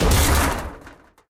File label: barrel collide; STALKER; IWP